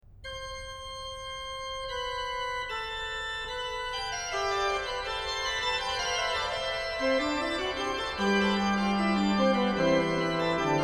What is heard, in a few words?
Fughetta, organ; BWV0701 Fughetta sopra Vom Himmel hoch, da komm ich her; BACH; BACH ORGAN WORKS; CHORALE PRELUDES